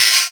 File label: Cymbals HH Open; Vengeance Samples